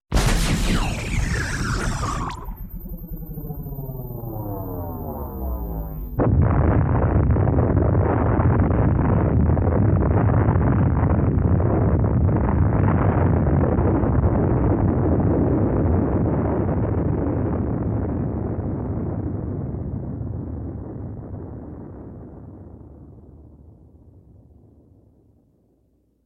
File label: Missile Explosion